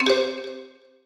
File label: PUZZLE Success Xylophone Three Note Wet; stereo; Universal Sound FX